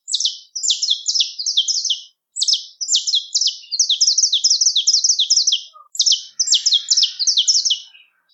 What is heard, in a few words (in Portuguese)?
Canto Canario da Terra Estalo com Carretilha